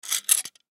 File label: Can Opener Pick Up; Pick Ups; Foley; Objects; Blastwave